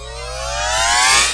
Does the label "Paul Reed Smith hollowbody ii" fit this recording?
No